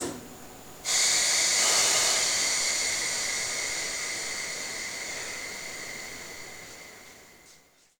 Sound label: Air High, Train; OpenBVE